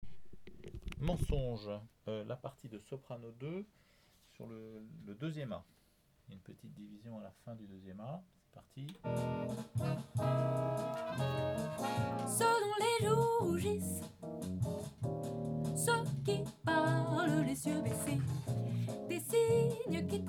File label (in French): Sop 2; Pinocchio